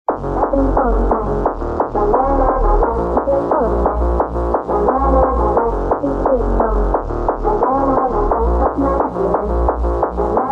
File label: hardstyle speed up remix